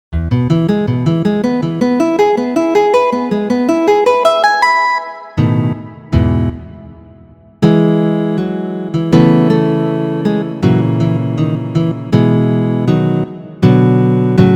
romanza sin palabras adagio; mendelssohn bartholdy felix

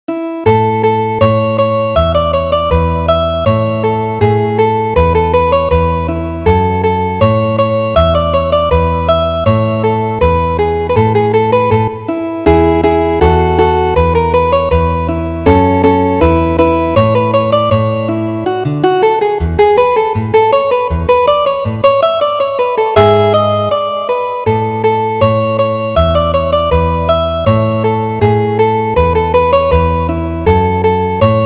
guitarra andante; Ferdinando Carulli

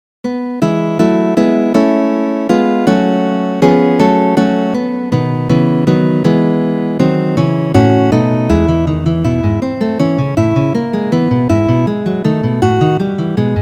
guitarra duos; Ferdinando Carulli

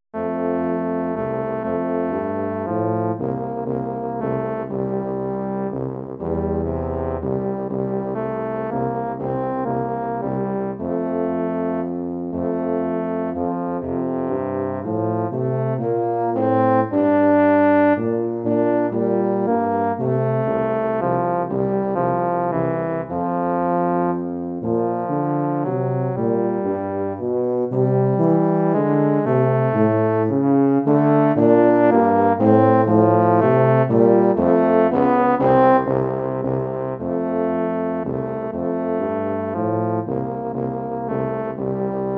danza cortesana para bombardino tuba

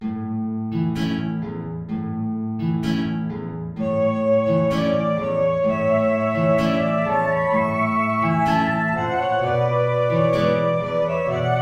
tango

